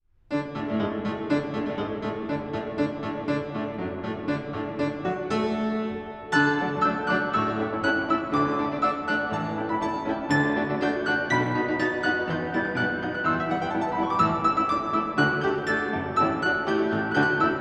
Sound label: Marche Militaire